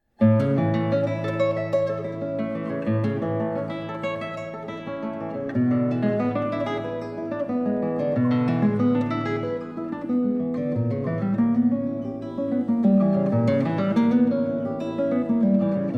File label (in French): Etudes pour la guitarra No. 2 Des arpèges Très animé